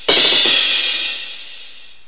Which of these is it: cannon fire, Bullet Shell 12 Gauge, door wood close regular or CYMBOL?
CYMBOL